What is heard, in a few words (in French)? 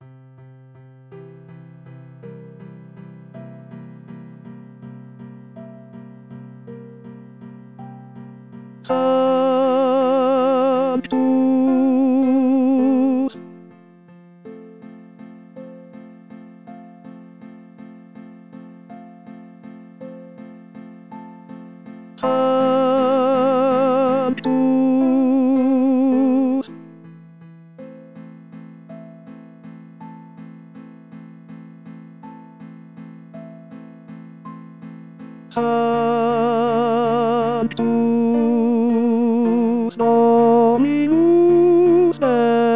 Messe Fa Sanctus Tenor; Shubert